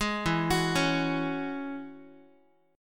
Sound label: E 6th Add 9th E down Guitar; E6add9 arpeggiated; JGuitar